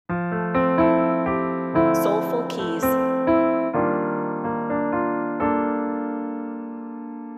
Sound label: Piano